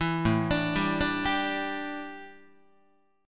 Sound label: A 7th Suspended 4th down Guitar, chord; A7sus4 arpeggiated